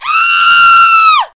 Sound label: scream